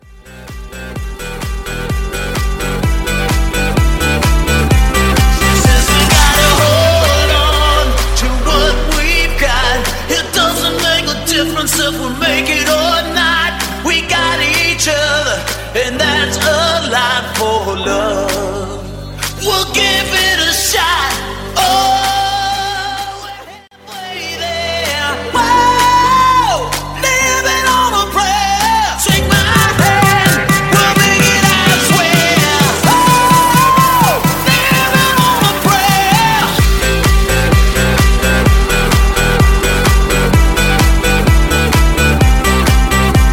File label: Hybrid Mashup 128